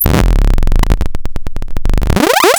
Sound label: Squeeqee Machine Calibration; 300 Drum Machines; DevSnd